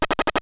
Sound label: Overworld Poison; P3D-Legacy